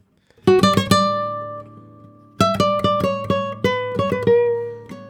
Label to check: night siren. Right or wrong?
Wrong